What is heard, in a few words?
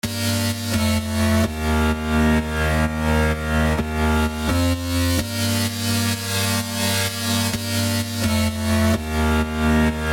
House